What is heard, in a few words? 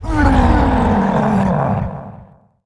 money bonus; warcraft3